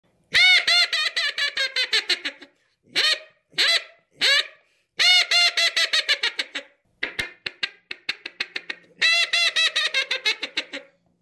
чирок свистунок утка

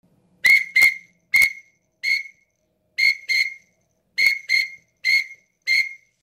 чирок свистунок селезень

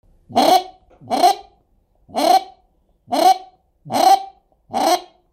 чирок-клоктун

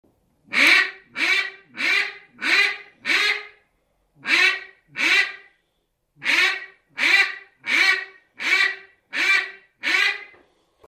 кряква утка

